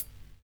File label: LOVE SNAR; Univers Sons